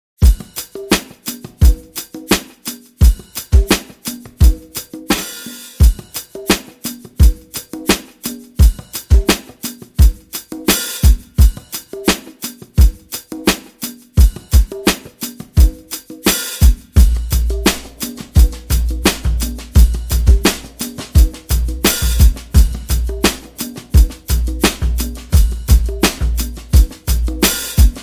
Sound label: drums